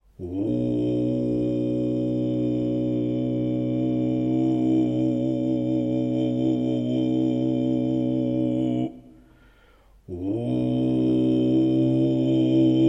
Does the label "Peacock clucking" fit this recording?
No